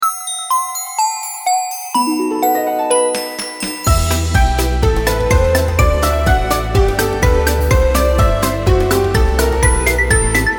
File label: karaoke